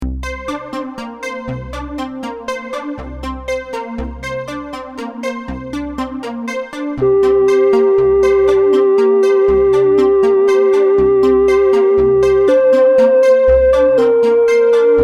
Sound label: Chorus Sweep